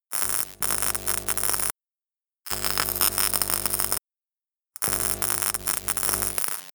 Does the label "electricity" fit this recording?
Yes